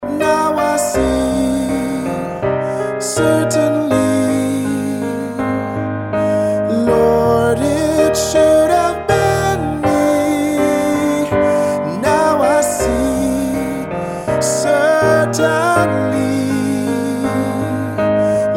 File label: ring tone